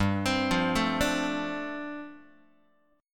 G 9th Suspended 4th, Guitar; arpeggiated; G9sus4

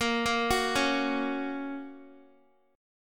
Bb Minor Sharp 5th Bb down Guitar; Bbm#5 arpeggiated

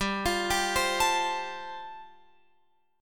G 7th Suspended 2nd Suspended 4th, Guitar Open F; G7sus2sus4 arpeggiated

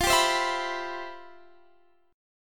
C Major 7th F; strummed; Cmaj7/F

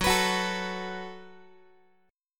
F sharp Minor Double Flat 5th, Guitar; strummed; x,14,14,16,13,x; down; 4A,4E,5A,5D,5Gsharp,6Csharp

